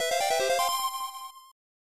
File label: Select Fanfare; Jikkyou Oshaberi Parodius; Parodius 3; SNES